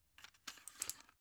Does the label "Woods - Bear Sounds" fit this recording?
No